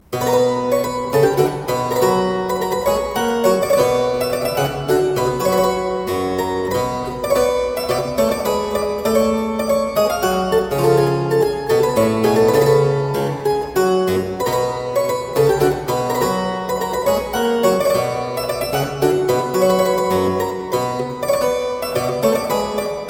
Suite in C Minor - Hornpipe, spoken, Keyboard Music; William Croft